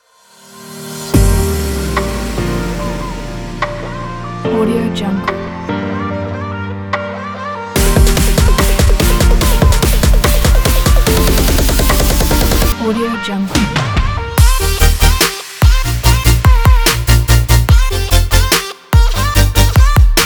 future bass

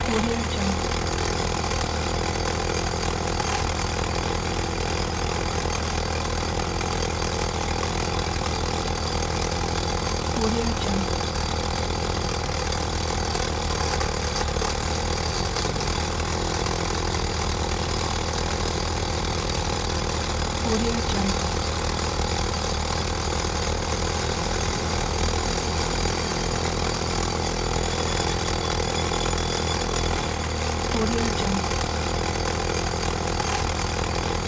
jackhammer

